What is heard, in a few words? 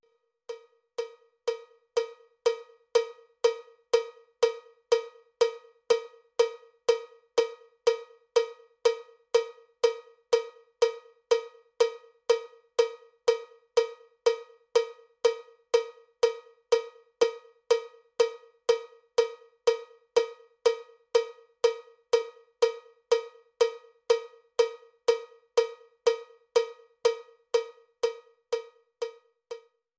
cowbell click track bpm 79; cowbell click track bpm 122